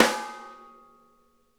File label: SNARE RIM; Sonic Reality; Interactive Drum Kits